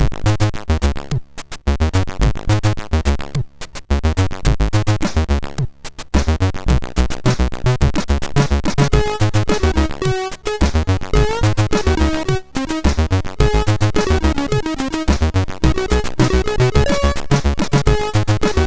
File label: intro, sid MOS6581R2